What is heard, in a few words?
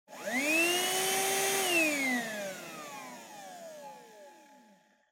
Vacuum Cleaner Vacuum